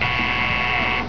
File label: buzzin; venezuela